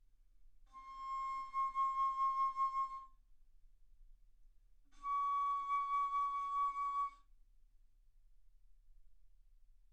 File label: Bass Flute ff C6 Db6